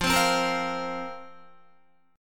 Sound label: F Suspended 4th, Guitar; Fsus4; strummed; 12,15,12,15,12,13